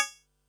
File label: HH, Pearl SC-40 Hihats and cymbals